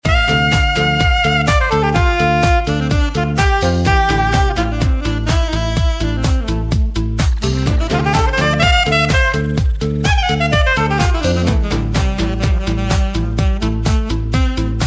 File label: Sax; Charts mit Sax Beispiel